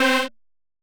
Lead Rave Girl Lead; Synthetic's Serum Collection

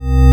single cycle waveform; Synthetic's Serum Collection; Cymatics - Single Cycle Waveforms for Serum

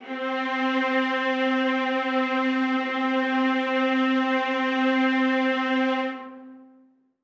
viola legato C3; Aubit; Plume Vol. 1 Noises

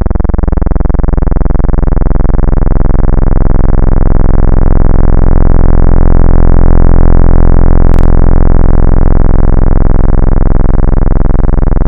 pulse; Synthetic's Serum Collection; BVKER - Synthwave

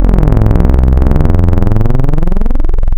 Digital Flanges Saw; Wavetables; Synthetic's Serum Collection; ESW Core Wavetables; Echo Sound Works Core Tables

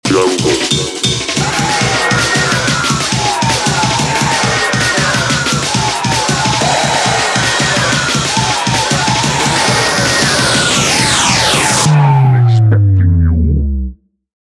Jingle Jungle; lolicore

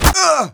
pain, player; Team Fortress 2

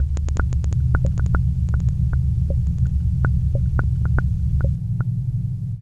locked grooves; lolicore

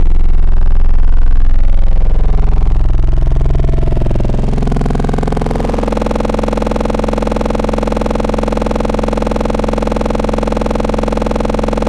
growl monsters; Wavetables; Synthetic's Serum Collection; ESW Core Wavetables; Echo Sound Works Core Tables

